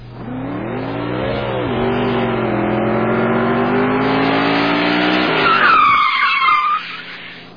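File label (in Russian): разгон мотоцикла и резкое торможение со свистом шин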